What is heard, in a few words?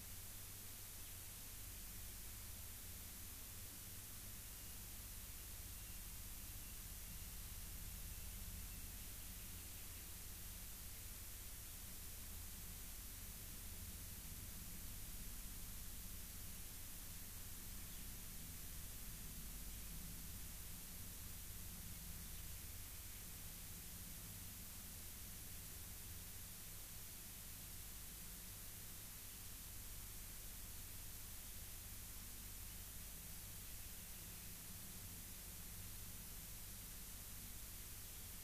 rayol farm; SEAMED